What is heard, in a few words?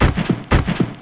Golem Run; Knight Online